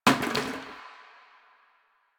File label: Janitor Plastic Bottle Water Drop